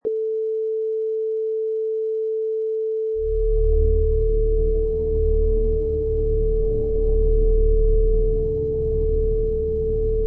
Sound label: Deep Evil Bass